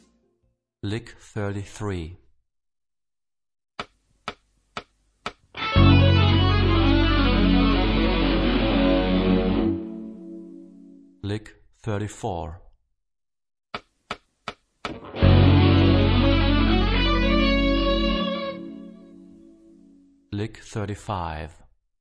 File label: Rock guitar; Rock gt Secrets